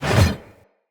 Hammer Whoosh; Weapons; Enemy; Blunt; Hammer And Shield Weapon

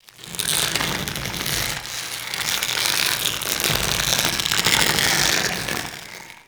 Infested Tendon Stretch, Ambience; Warframe; Corpus Infested

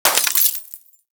Bullet Impact Debris Glass; Warframe; Weapons; Bullet Impacts

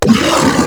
Flying Mouth Pain; Warframe; ManInTheWall

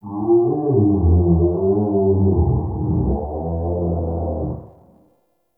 Inside Vocal Groan Enter; Ambience; Bromius

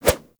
Generic Flip Whoosh; Warframe; Characters

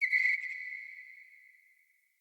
Bow Arrow By Charged Echo; Weapons; Player; Bows; Josalfar Bow

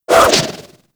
Auto Crossbow Tail; Warframe; Weapons; Tenno

